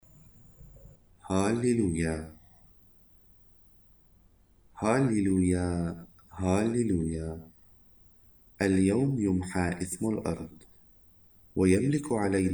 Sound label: Halleluiah, arabic mass